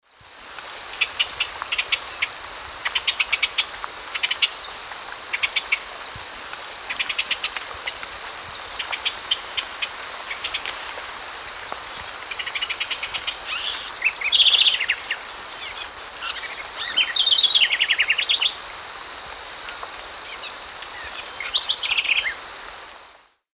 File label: Troglodytes aedon, scold and song; S. America; Eastern Lowlands